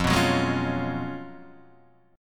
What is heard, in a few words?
F sharp Major 7th Suspended 2nd Suspended 4th, F